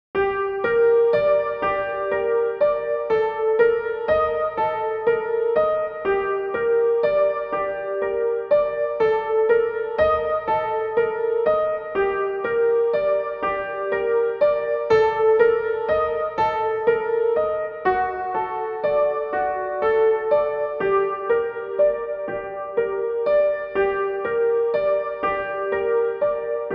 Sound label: Piano melody